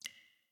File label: Cave Random Drips; The Inn; Devour 2021 gamerip pc macos